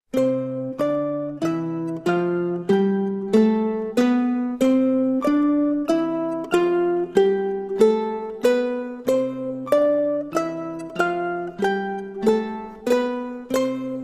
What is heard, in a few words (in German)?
shepard effekt